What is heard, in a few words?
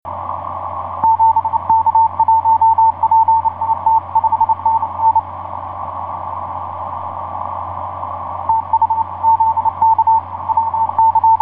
20m cw; ik2wet